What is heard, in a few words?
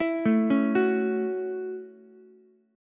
Gb Minor 7th Gb down Ukulele; Gbm7 arpeggiated; JGuitar